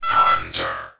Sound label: blip; hunter